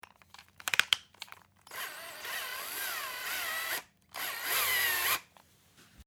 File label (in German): home akkuschrauber